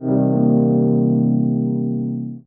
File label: Cm Keys